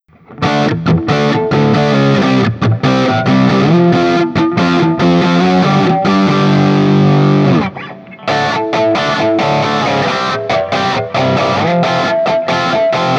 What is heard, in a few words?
Guild Burnside Crossbow Stock JCM800 A, A-Shape; Burnside (by Guild) Crossbow